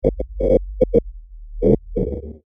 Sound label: Alien Abstract Breathing; Scary Breathing Sound Effects; science fiction sound effect; star wars / trek style games